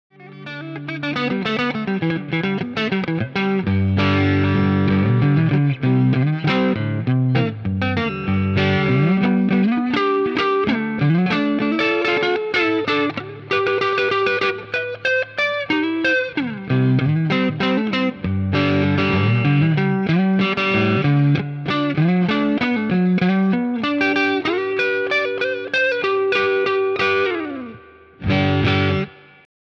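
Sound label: Strat Blade Country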